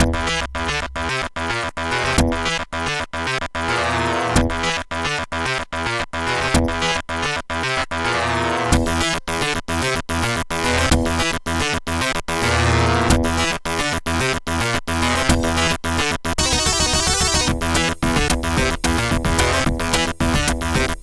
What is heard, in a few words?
DOOM AWE64 FM aliasing